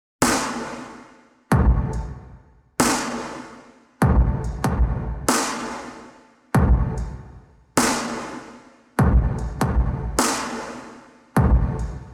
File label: drums de verb